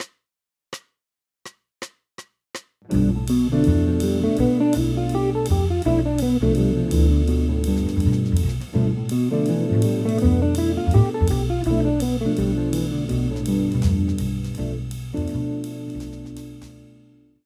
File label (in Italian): Bm7b5 in posizione di A in drop 2